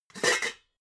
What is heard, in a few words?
pots clank